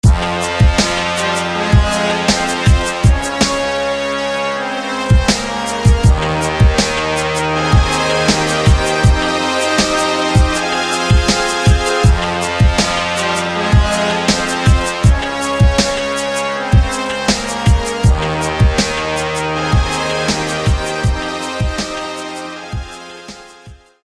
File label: Brass; MegaD; SFB FX